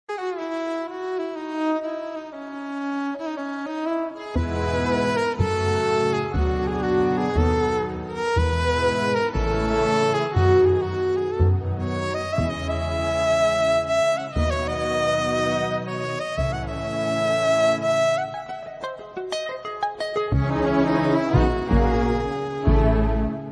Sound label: instrumental bgm; kamal haasan; revathy; k balachander